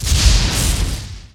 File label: projectile impact; Zeus